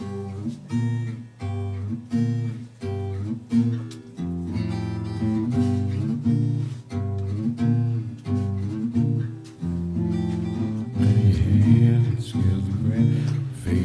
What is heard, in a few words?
Jam